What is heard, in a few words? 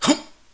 jump; metal bullets; player